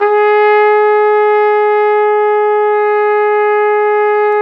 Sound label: Flugelhorn, Solo Brass